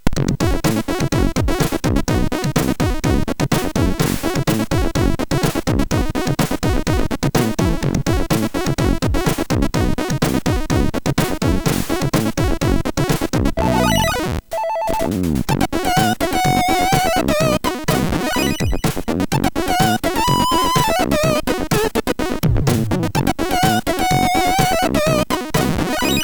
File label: Score, MOS6581